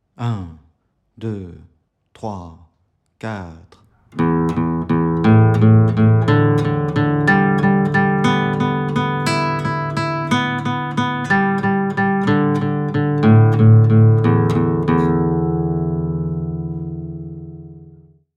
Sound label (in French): exercice c 060bpm